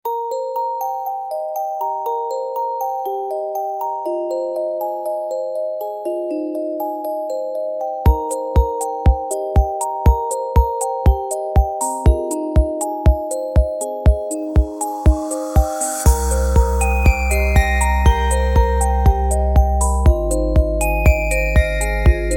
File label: ice kingdom; freepixel; Biomes